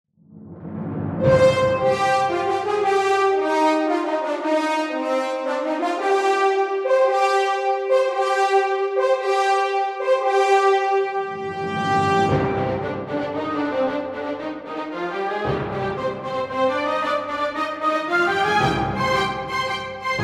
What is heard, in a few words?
Welcome Aboard Perc; Church worship / Film Scores